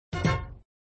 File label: Sound Fanfare End of Ball, flipper; Fanfare End of Ball v10